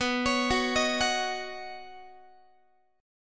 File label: B Major Add 9th B Guitar; arpeggiated; x,19,16,16,19,17